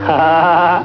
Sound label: Laff; Elmer Fudd